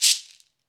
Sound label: Ahfuche; Club-50 - Foundations Roland; Ahfuches